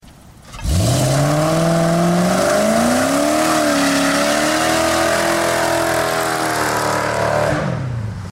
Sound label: burnout